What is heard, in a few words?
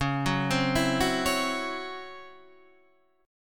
Db Minor Major 7th Double Flat 5th Db Guitar DADGAD